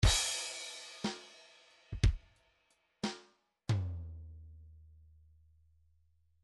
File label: midi file grooves 3 4